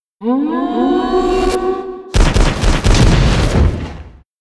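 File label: giant wolfleader attack; monster; Senillinea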